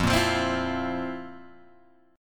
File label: F Major 7th Suspended 4th, Guitar, Open G